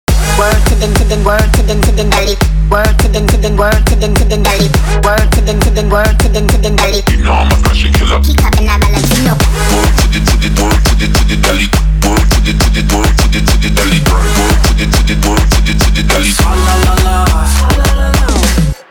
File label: trap; Trap RMX